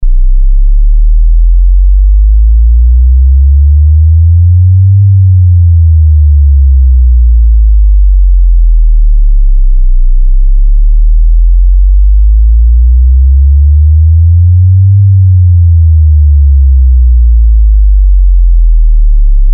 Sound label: Cabinet Seal